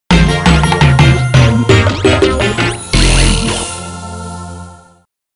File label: Fanfare- Golden Brick; lego racers 2